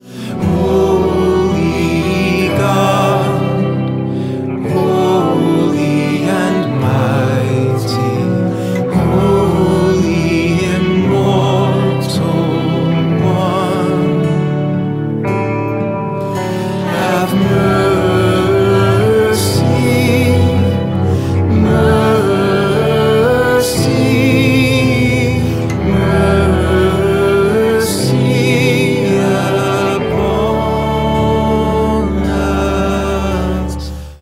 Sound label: Trisagion Liturgical Folk